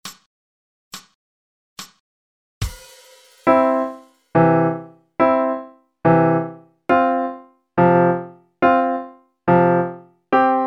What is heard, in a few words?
Bouncing; Nay ngon